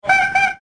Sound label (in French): Pouet Pouet, triporteurs